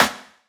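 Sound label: Claps and Snares; T-Elastic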